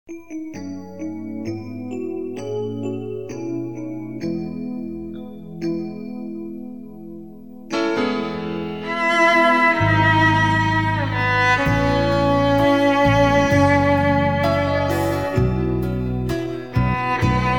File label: Cello